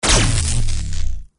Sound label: bullet dasher quake; battlearray